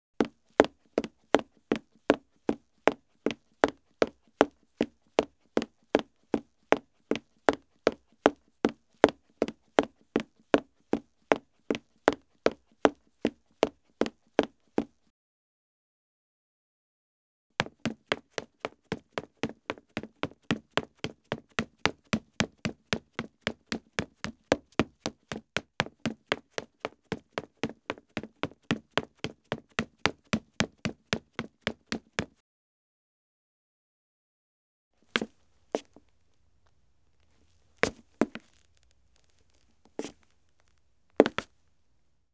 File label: steps wood job m ha, foley effects; Asian Show Sound Effects